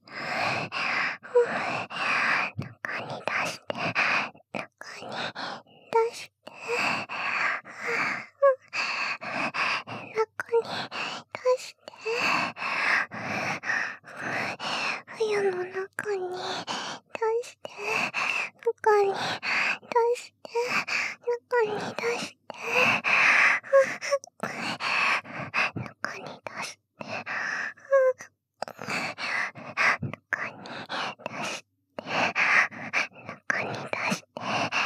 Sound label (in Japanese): 中に出して 喘ぎ